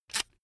electric drill template adjust heavy out; soundpool

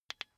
car key button click signal fast

